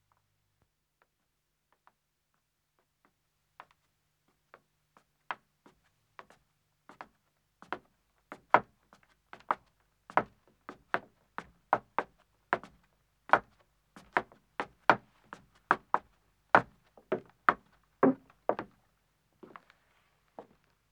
Wooden Stairs Ascending, 2 Wom, Footsteps; BBC Sound Effects Library; Footsteps II

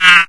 creature, Retro Sounds; Kenney's Sound Pack; Retro Sounds 1

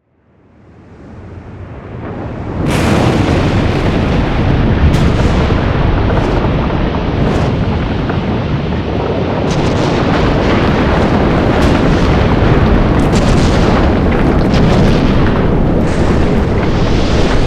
Volcano Eruption; Olivier Girardot; Guns & Explosions

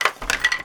Rustles - Pile, Debris, Wood; Double Trouble Audio; Wood Impacts and Debris

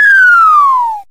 lose; Kenney's Sound Pack; Retro Sounds 1

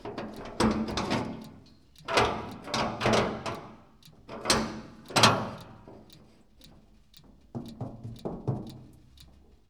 R35 Renault, Movement and Activity, Engine; Pole Position

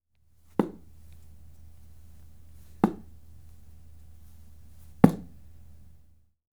Darts Dart Thrown At Board; Sport Leisure; BBC Sound Effects Library

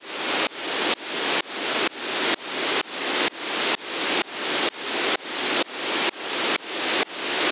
Drop Noise, White Noise

